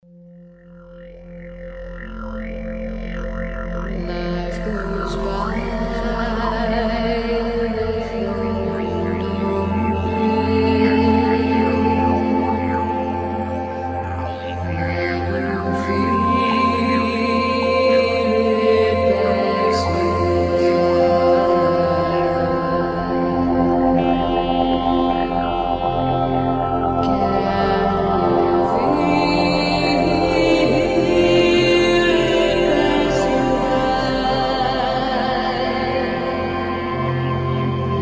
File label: background score; tata safari dicor